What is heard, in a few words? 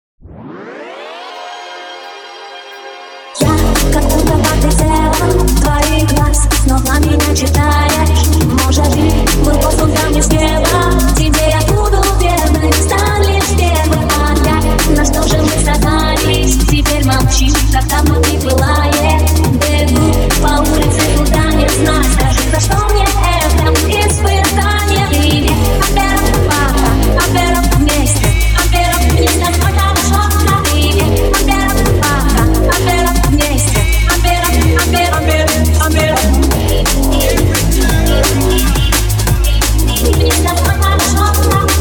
DNB